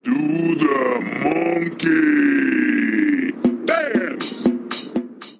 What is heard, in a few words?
monkey dance